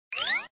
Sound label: anvil Walk Over